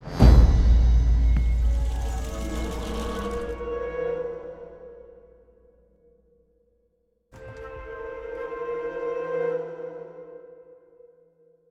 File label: Sting; Crane Abducted